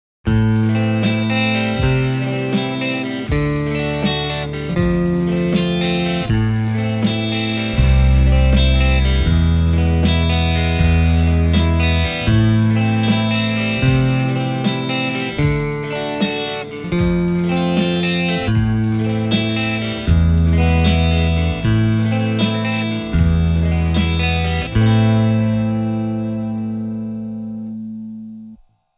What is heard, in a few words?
tele JCM800; mercuriall